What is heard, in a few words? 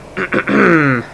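clear throat; People; Sound Library 2000; Wayzata Technology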